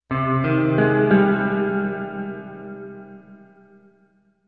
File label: Music Logo Spy Movie, guitar